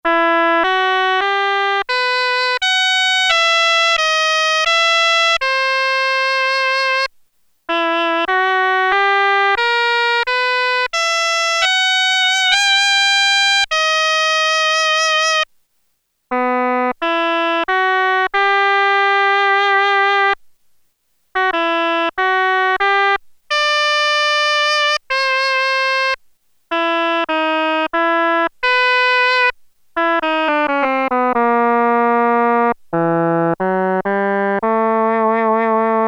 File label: Oboe, Prophet-5; Sequential Circuits Prophet-5 (Ver.3); Factory Patch; File 3 Programs